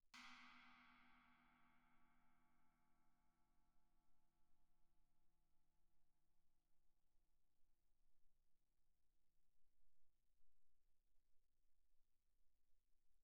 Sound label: chinese stick shoulder pp, suspended cymbals; Percussion